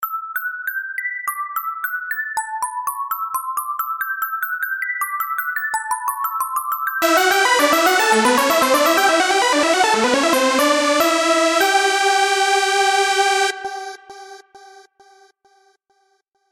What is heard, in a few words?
Love Alert